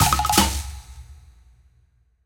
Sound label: win big win loop end mega; rise of maya